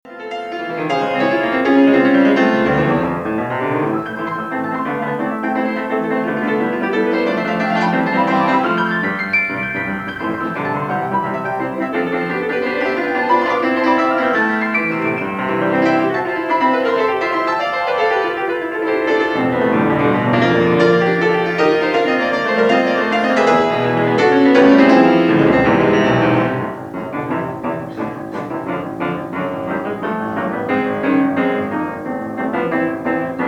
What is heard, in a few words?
Sonata Classical solo piano; Prokofiev; Sonata 3